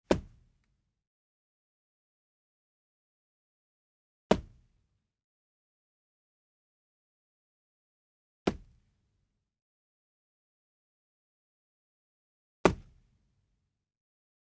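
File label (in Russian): удар головой о стену удары в живот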